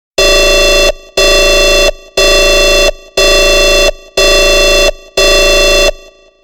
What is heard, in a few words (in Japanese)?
keihou